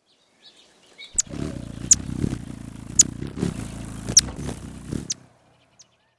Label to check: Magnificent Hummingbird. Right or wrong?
Right